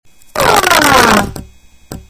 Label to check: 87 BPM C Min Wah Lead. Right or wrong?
Wrong